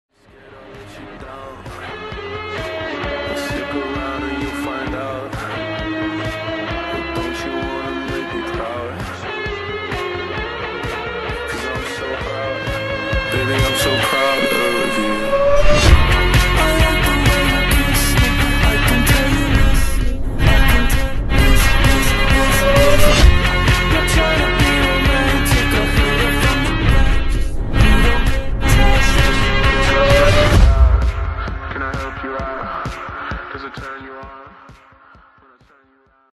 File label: guitar remix